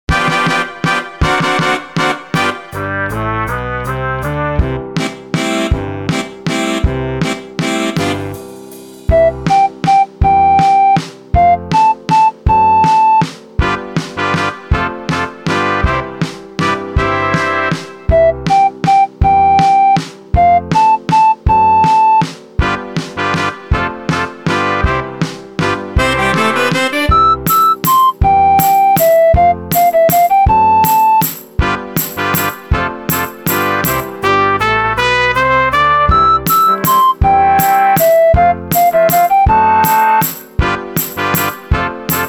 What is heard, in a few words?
waltz melo